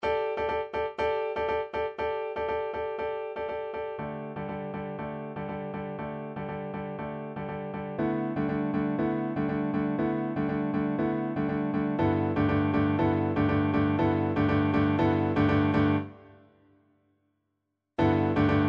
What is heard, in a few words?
piano; 村祭り